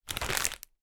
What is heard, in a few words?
food peanuts roasted packet pick up